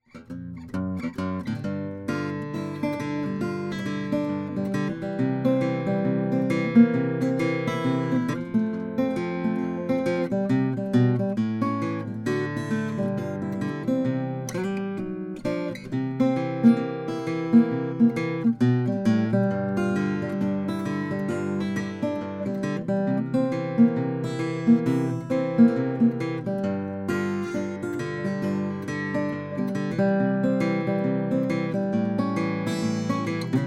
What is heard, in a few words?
regal kleartone gem burst; 1934